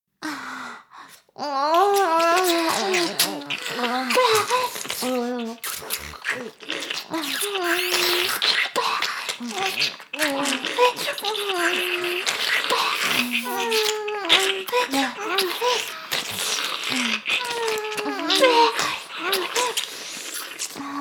W耳舐めフェラ音声; LR逆ver; 牧場戦腺

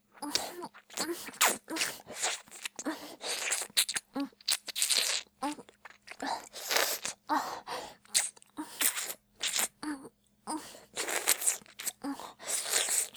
ボーイッシュ系音声素材, 普通にフェラ; Honey Drop

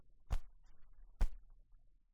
ぽんぽん音

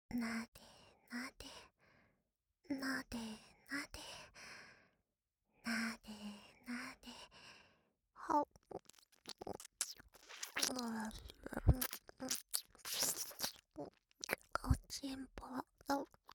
なでなで, 左, 効果音 なし

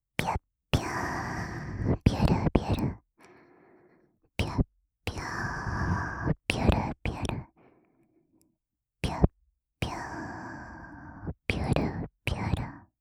射精

